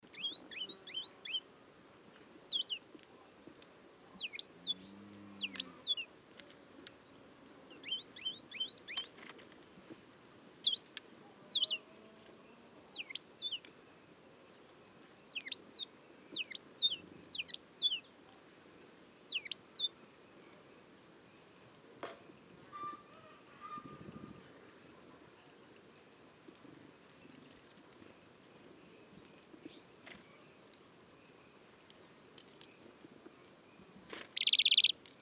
White-crowned Peduline Tit Remiz coronatus, song, Aqoel, Kazakhstan